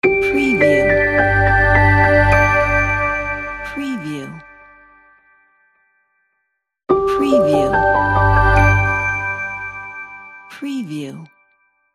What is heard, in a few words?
glorious awakening